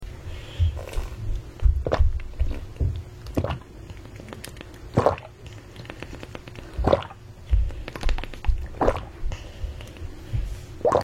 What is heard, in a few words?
asmr drinking water gulping sounds; SORBO DE AGUA